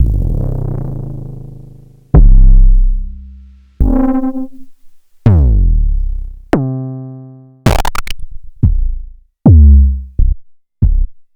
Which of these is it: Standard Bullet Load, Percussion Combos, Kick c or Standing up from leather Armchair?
Kick c